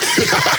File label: Vocal Adlib Male Laugh Evil; bass house